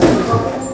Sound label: Bugging me, Hip-Hop Street Beats; Sonic Foundry; Construction Kit; Street Beats by Poogie Bell